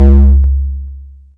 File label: tom, Korg ER-1; Kit 7